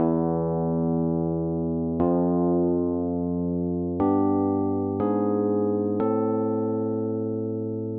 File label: Chord